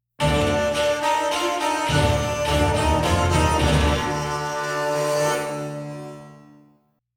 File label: Combat Out